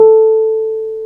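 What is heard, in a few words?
piano, Wurly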